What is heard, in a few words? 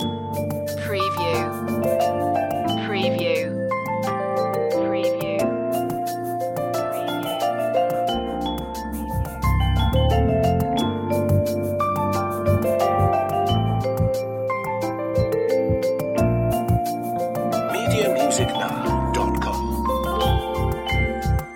gravel groove loop